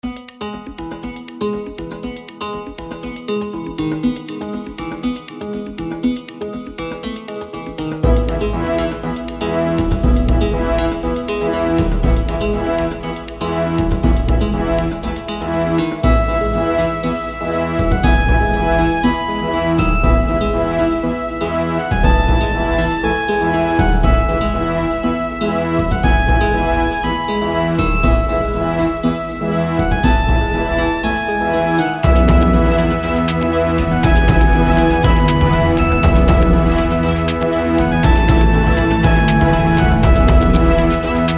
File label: framedrummer